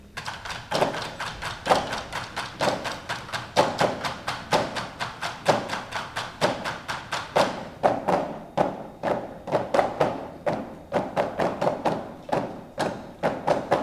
Perc; Class Feature

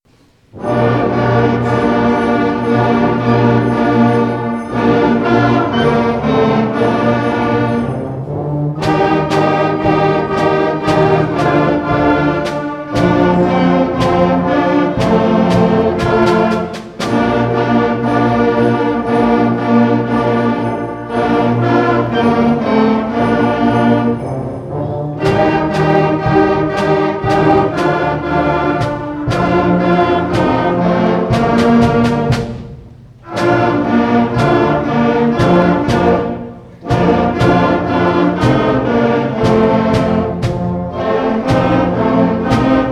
Holiday Concert; First Holiday Concert2014

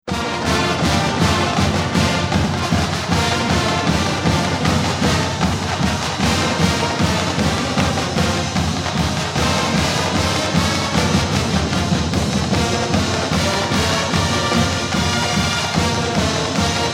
Fight Song - March Band; Trojan

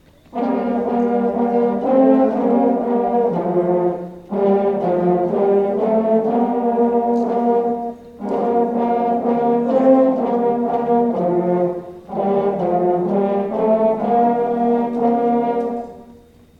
Horn Class Feature

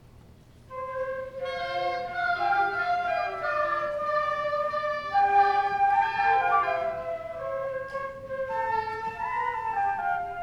Flute

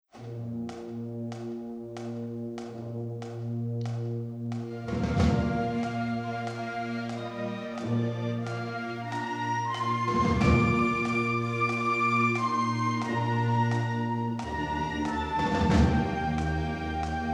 Marching Band; Battle Hymn of the Republic

